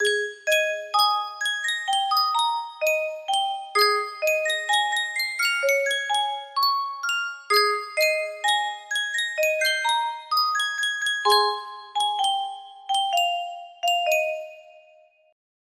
Yunsheng music box, music box melody

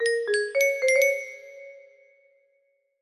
melody

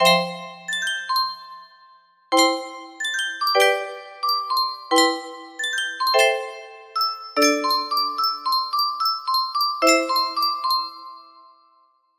melody

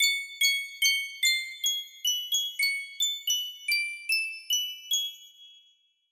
space scary, music box melody

